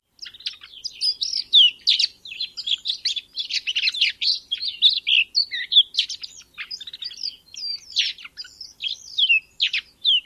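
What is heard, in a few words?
snow bunting subsong in winter flock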